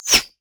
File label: thorn plant; Zyra; LoL